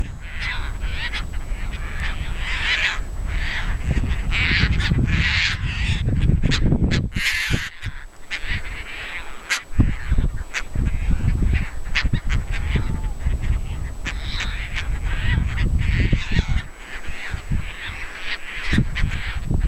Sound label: Hydroprogne caspia